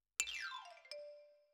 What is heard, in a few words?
Xylo Close